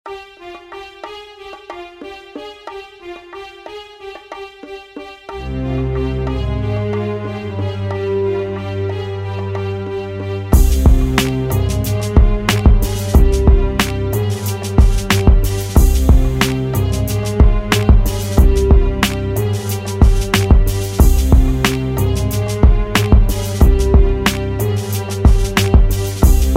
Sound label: instrumental